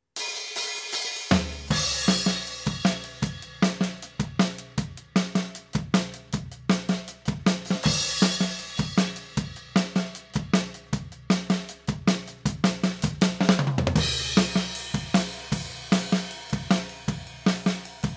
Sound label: drum